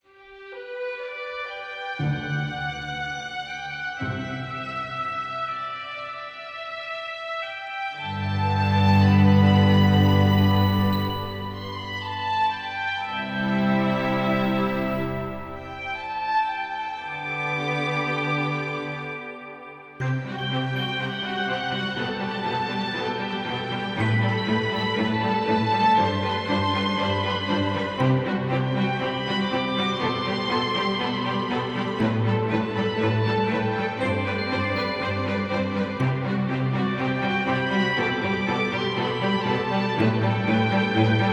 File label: Pretty Ambient